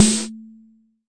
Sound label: Jomox MBrane drum machine; Jomox MBrane 11